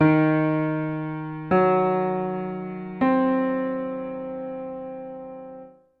verm arp, gehoerbildung

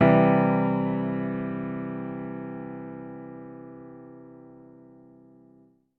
dur akk, gehoerbildung

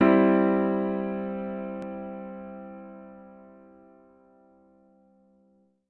dur akk

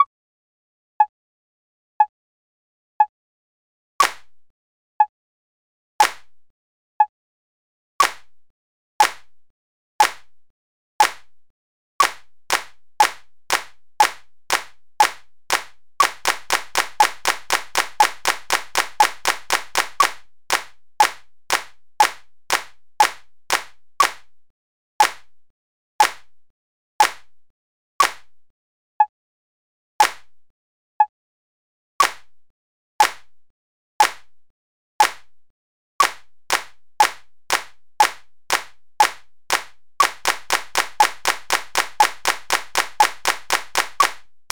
notenwerte 60, rhythmik

